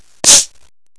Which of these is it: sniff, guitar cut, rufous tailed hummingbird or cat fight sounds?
sniff